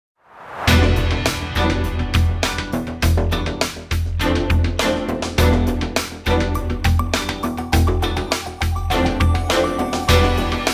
Break Looper; Level Vinoma Break Looper; Hardcore Mecha

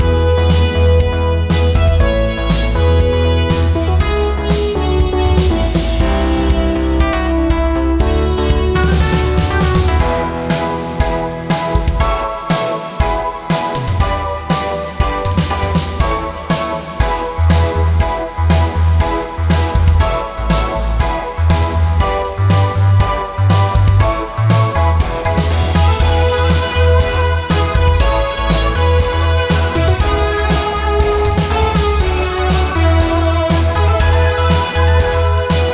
80s Victory; Chillheim

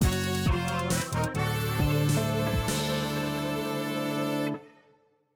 Story Lose; pro yakyu famista 2020 switch gamerip 2020

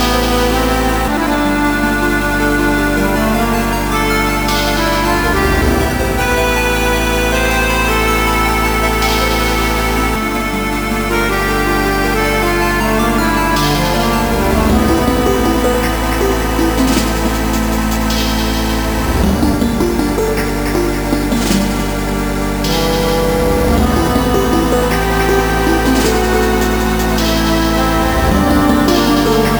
Level 1 Loop; high strangeness; wii u

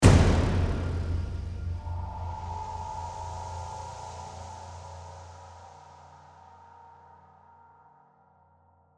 Big Robot Fight Low Outro; horizon zero dawn

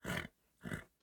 horse grunt; teardown; ps5 windows xbox series xs

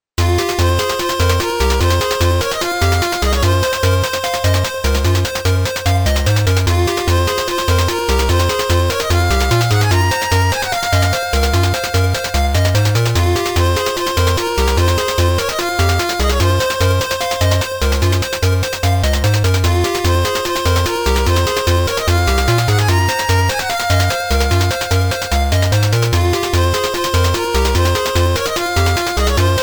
Forward March 8-bit; Vestaria Saga I Soundtrack; Piano Arrangement; 8-bit Music Version

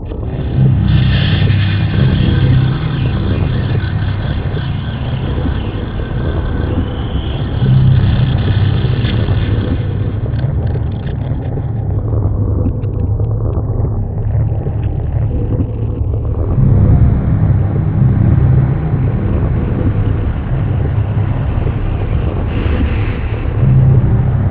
Catacombs Sound; Shadows of the Damned Hella Remastered

